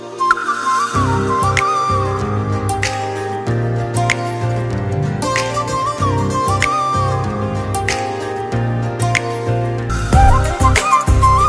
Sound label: Flute Song Ringtone